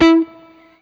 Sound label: BLU PICK, Guitar & Bass; 90 s Sample CDs; Quantum Leap - Guitar & Bass